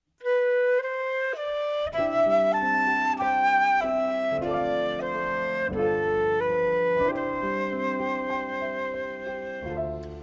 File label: Irish Band; Chicago